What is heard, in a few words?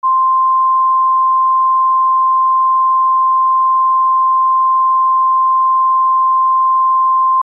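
short beep sound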